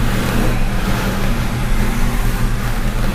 plastic barrel scrape smooth loop; hl2

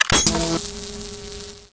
bolt load, crossbow; hl2

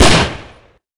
smg fire; Sourceforts2.0; stieff; weapons

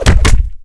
citizen punches, ambient voices

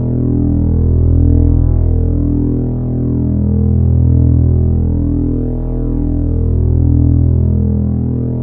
Full power, physcannon; Sourceforts2.0

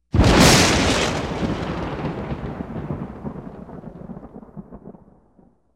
thunder; Sourceforts2.0; rainthunder

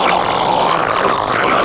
gurgle loop, fast zombie; hl2

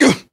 death; Sourceforts2.0; player

